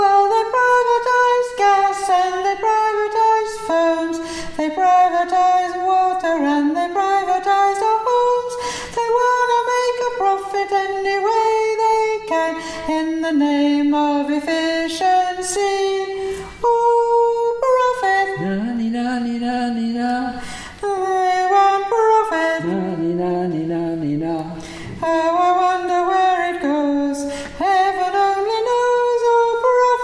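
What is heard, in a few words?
Soprano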